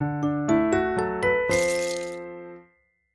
block game intro

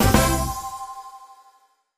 gameshow chip minor

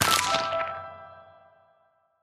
wood tile disappear

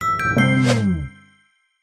game show quiz bonus 50 50

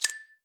block tile pickup

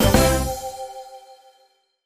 game show chip minor

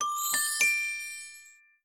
block chip major

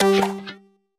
game show tile flip

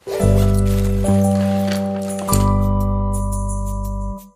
wood game intro

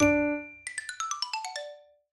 block wheel segment eliminate

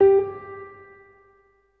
wood spinner

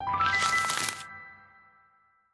wood tile appear

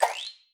block tile appear

